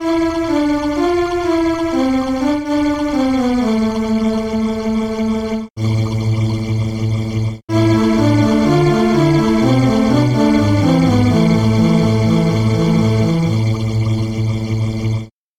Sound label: sweep pad